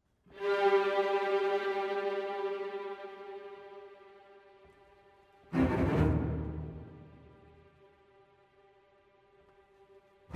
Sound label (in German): Sinfonie Nr2 c moll live; mahler